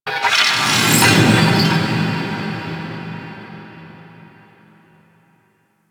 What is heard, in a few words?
Metal Impact Large; Ambience; Warframe; Cephalon; DE